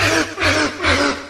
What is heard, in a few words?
laugh; susie